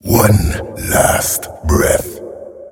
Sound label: Attack; League of Legends; Pyke